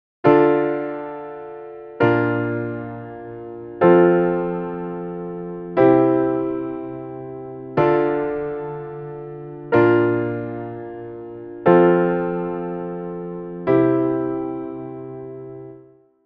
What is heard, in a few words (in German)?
moll; popformeln